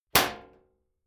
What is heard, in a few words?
club hit sheet clank medium